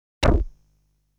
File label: Sound striking the rock; Legend of Hero Tonma